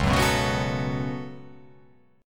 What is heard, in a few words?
C sharp 7th Suspended 4th Sharp 5th